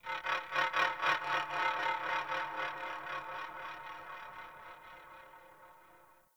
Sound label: critter croak; Warframe; Tau